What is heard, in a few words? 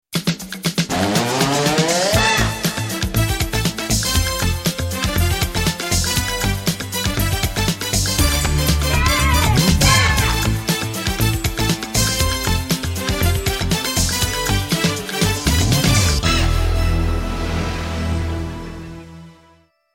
Intro Music